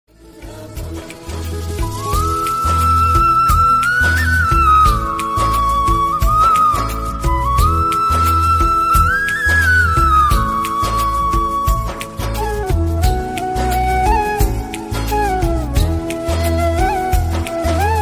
Flute Version Ringtone

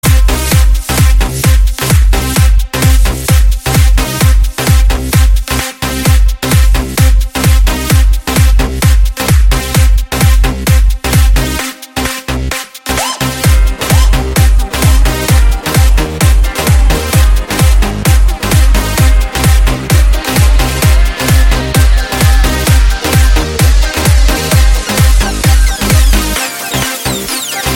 Music Ringtone

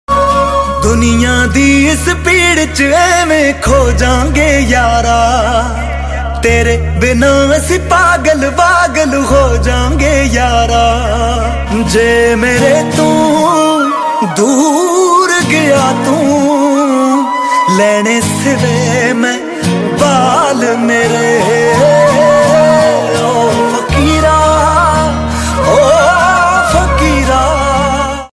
Sad Punjabi Song Ringtone

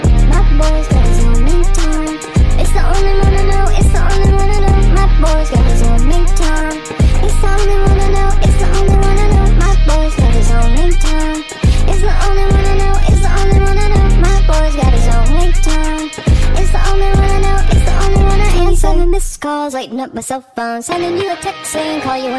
Remix Ringtone

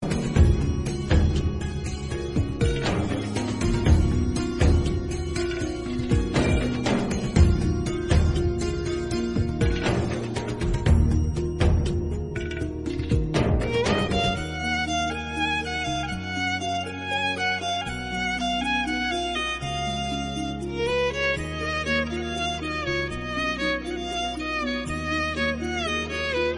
Instrumental Ringtone

